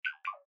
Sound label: bip bop